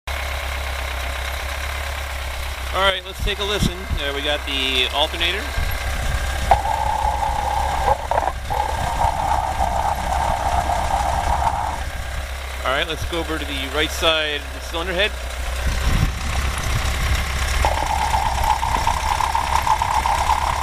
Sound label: Engine Sound, vw